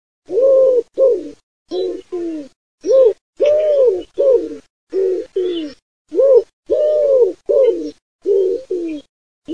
colombaccio colombo

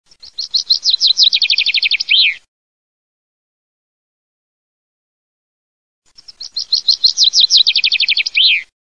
FRINGUELLO, birdsound

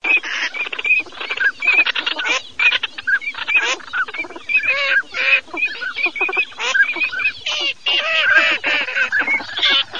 alzavola codone

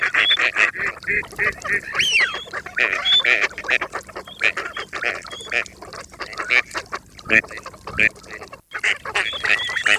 CANAPIGLIA

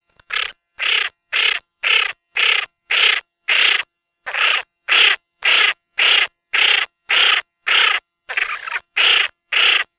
MORIGLIONE VOC

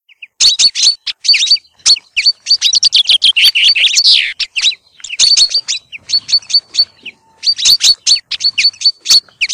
FRINGUELLI

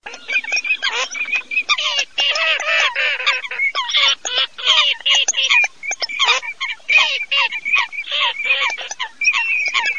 ALZAVOLE FOLAGHE

